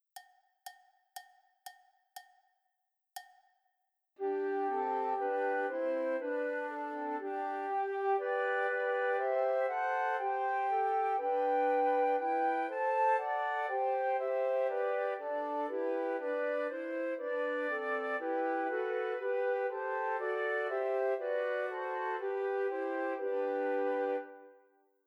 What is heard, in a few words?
Trios for Three Descant Recorders; Christmas Trios